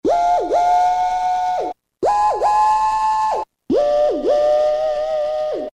whoo-whoo; Roland M-DC1

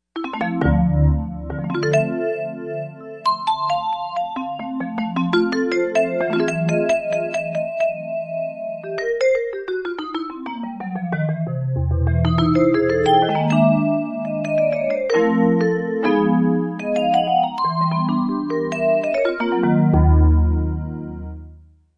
Vibraphone, Yamaha TG55; Factory Presets

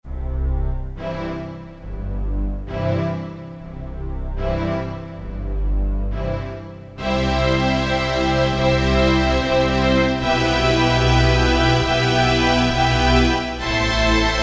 ORCESTRA, Korg DSS-1 Strings

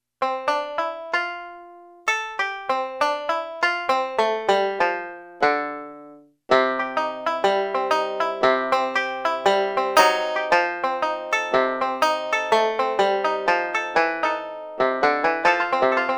Banjo

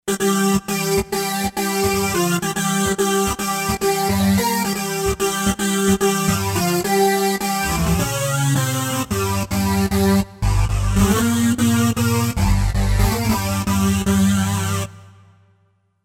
Dance Saws; Roland SC-880; Factory Patches

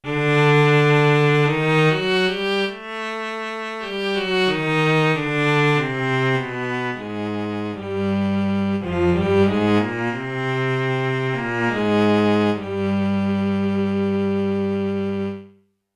Oct-Unison Cello, Yamaha TX16W

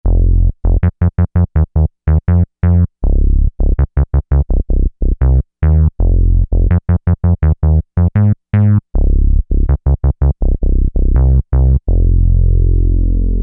Chubby Bass

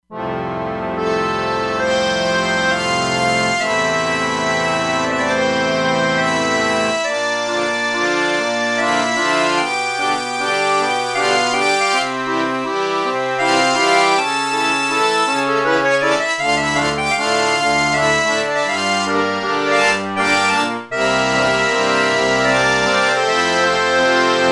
Harmonium, E-mu Proteus-3; Factory patches

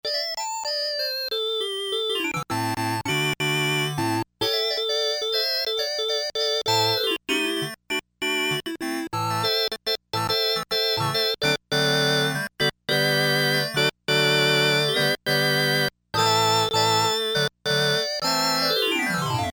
Organ 16' + 2', Crumar Trilogy

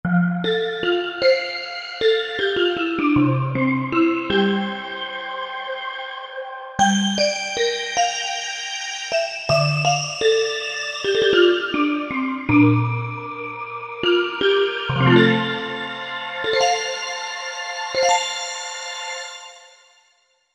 Synthvibes

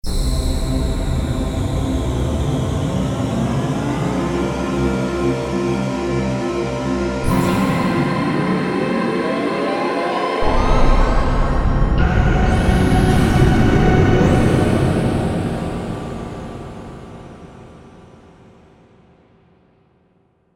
Roland U-220; Factory Patches; Catastrophe

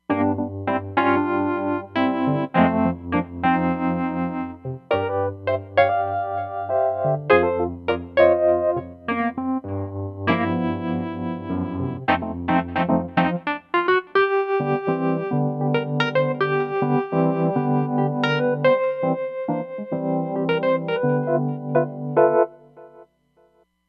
Vel Pan Wurly

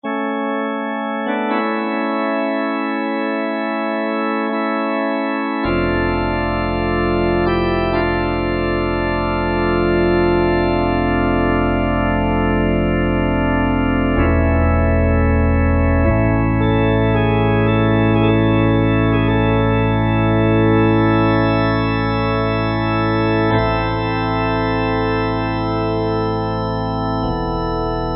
Cath. Pipes; Kurzweil K2000; Kurzweil K2000 Value Pack; Extra Programs

